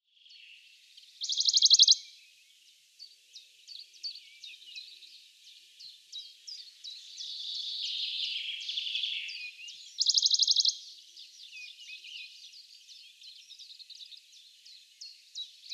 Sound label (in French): pouillot de Bonelli Phylloscopus bonelli; balade a l'ecoute des oiseaux